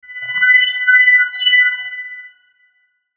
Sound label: Logo Choppy Swell; Sonic Logo; Blastwave; Sonic Logos; Production Element Imaging Element Accent Transition